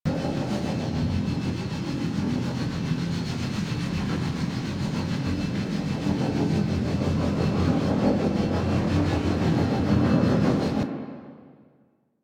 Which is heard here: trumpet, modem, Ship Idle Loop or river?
Ship Idle Loop